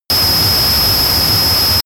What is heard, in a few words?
bomb fly fall